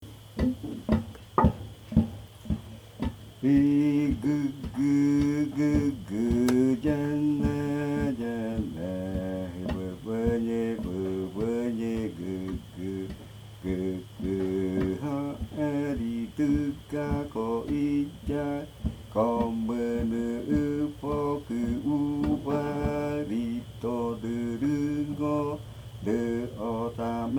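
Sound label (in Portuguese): Música canto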